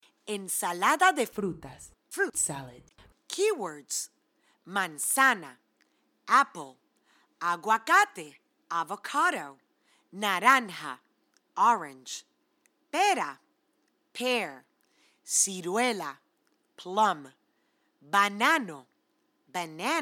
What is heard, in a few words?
Fruits Fruit Salad Spanish